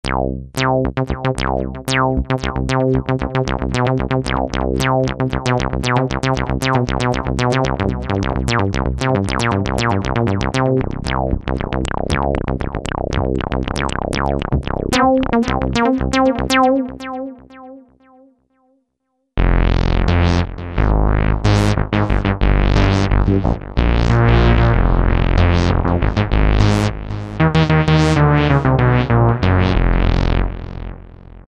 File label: SYN TB303 MC202; Best Service Gigapack